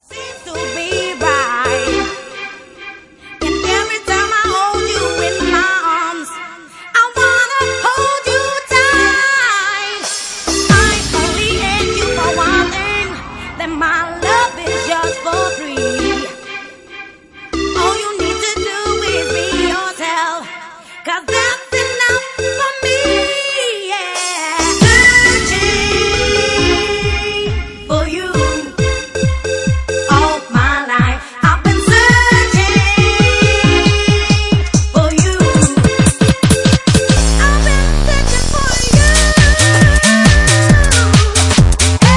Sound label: Organ Mix